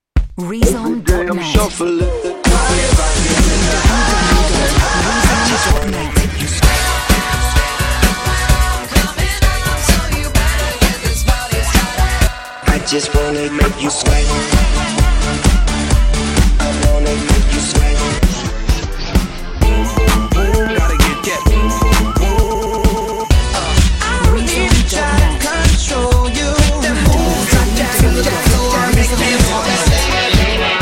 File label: montage 130bpm